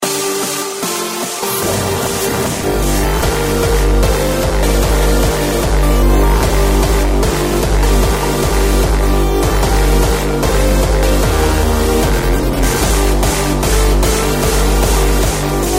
Hard Style; Croatia

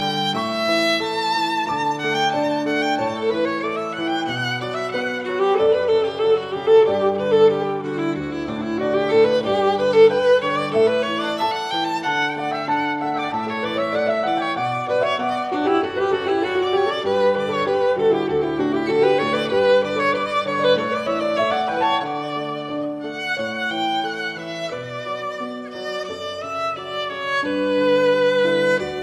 Canon In D Major

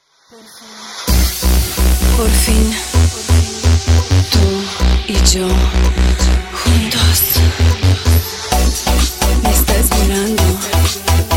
Narcotic Sound

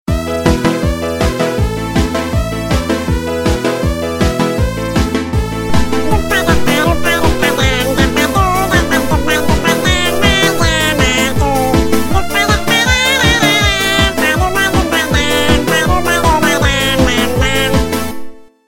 Polka Dance

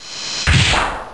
Gate Close; MMX

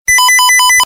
High Volume Sms

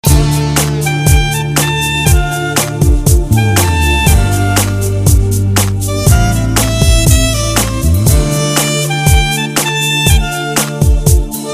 Sentimental Sax

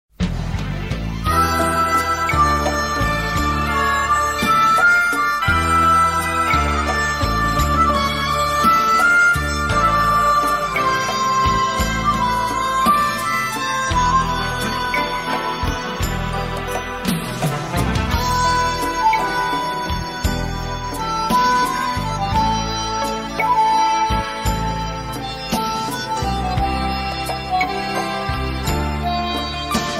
Assyrian

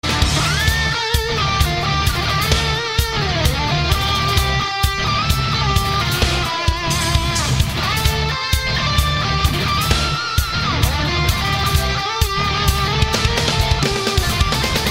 Solo

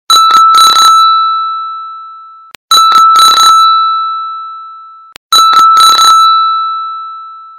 Corporate Ringer

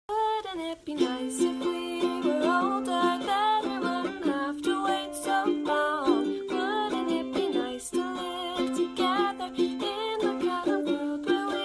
ukulele

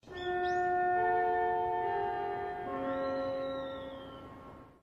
Anime school bells

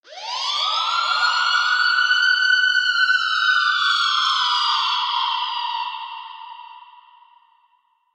police

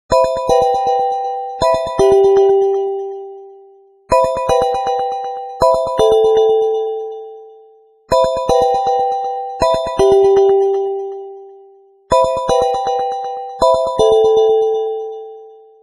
Nokia Asha Call

